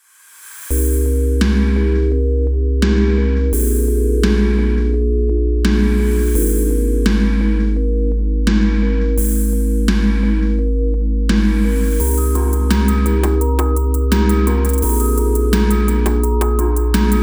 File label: Single Cycle Sine Wave